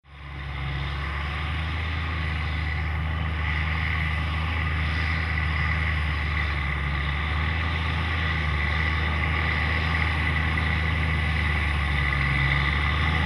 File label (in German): Claas Xerion 5000, Motor: Caterpillar; Hubraum: 12,5 Liter; Leistung: 524 PS